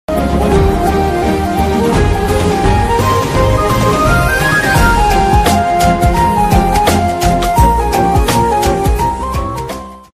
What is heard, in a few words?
instrumental ringtone; andhra king taluka; ram pothineni; bhagyashree bhosre